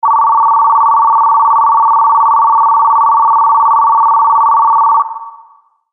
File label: alarm train bell